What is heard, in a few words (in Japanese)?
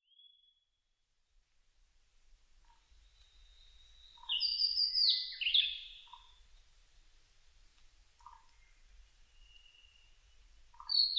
ooruri